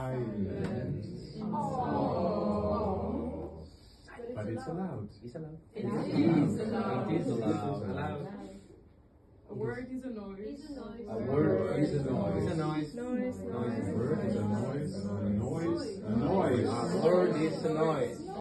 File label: loud cows choir live